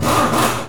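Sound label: Impact big, air tools, industrial; Air toolks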